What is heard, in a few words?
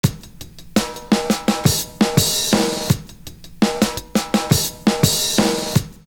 fill, loop; Univers Sons